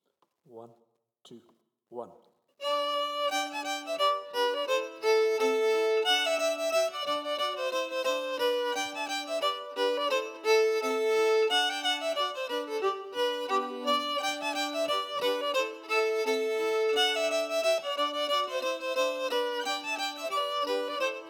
Reel melody emphasized; Reel Des Oignons

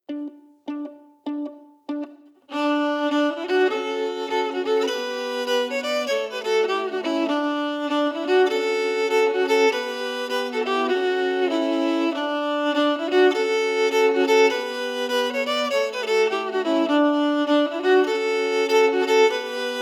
melody emphasized; La Maison de Glace

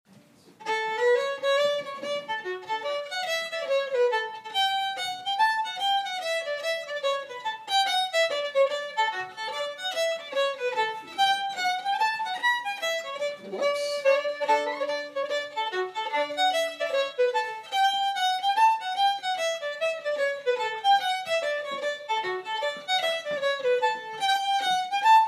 Hornpipe

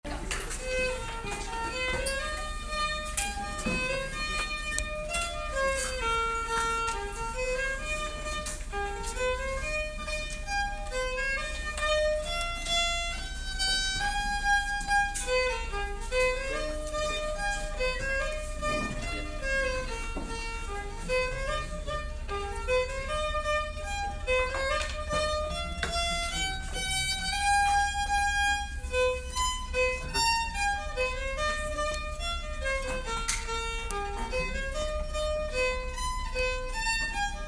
polka

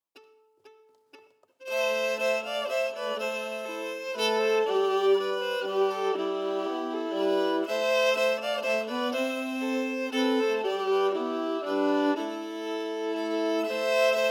Bonny At Morn harmonies emphasized; Peters version

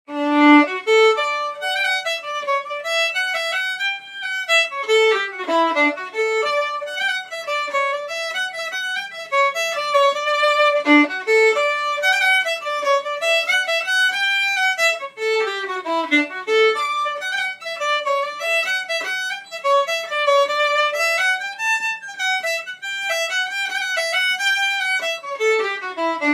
Jig

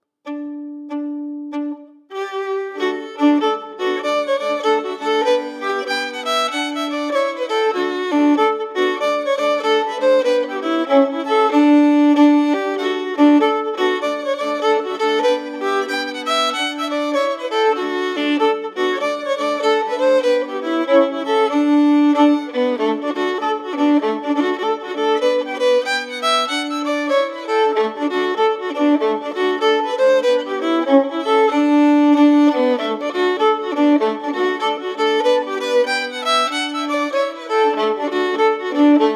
Jig, melody emphasized; Brookfield

